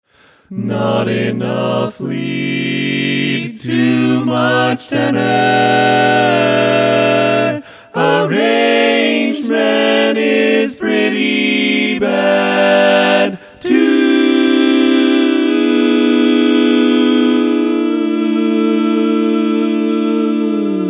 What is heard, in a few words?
Not Enough Lead Too Much Tenor, All Parts, Barbershop